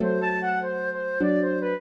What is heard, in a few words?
trio, flute harp; Mozart